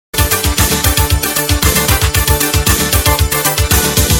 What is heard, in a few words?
Loop